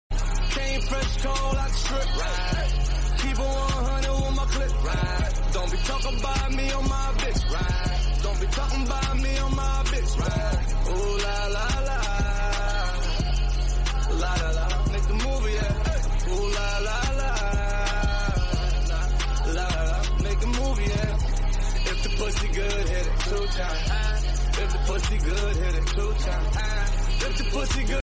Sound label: iphone 8 plus french ringtone